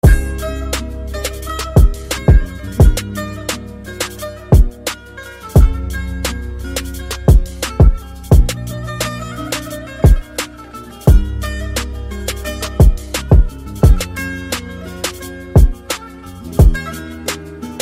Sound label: turkish saz toprak